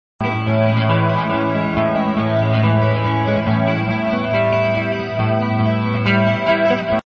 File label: pickin; pickin' with delay; guitar